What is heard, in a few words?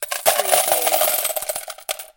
Slot Machine Win - Single Coin Falling Into Payout Slot